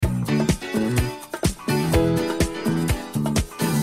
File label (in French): Majeur ou mineur E